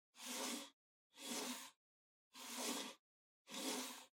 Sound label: PC-Mouse-Move (Far-Wood-Long)